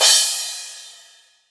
subtle reverb drum crash sound f key, Royality free drum crash tuned to the F note.